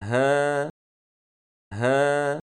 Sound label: haha